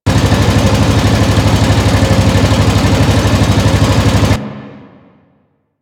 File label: Gas Smelting Pot Loop; Ambience; Warframe; Corpus Gas City